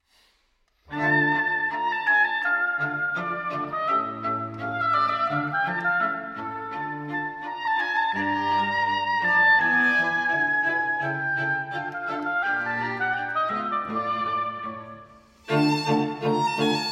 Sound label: Oboe Quartets; No. 2b, Rondo moderato